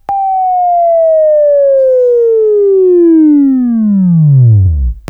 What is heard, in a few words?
WAV Left, Sweeping; Sweeping Training program